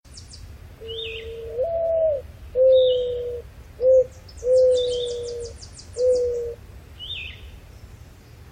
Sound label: mourning dove; Plachushaia Gorlica; Animals